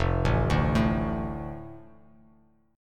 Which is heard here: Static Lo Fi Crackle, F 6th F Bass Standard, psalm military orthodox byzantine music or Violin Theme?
F 6th F Bass Standard